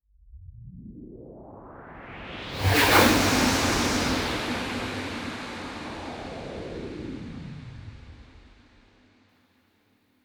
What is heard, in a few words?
FX Whooshes; FX Bundle 1; Free FX Bundle